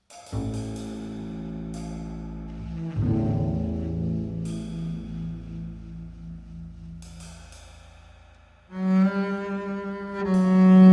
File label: Drums and Symbols